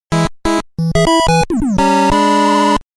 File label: Game Over (8-Bit)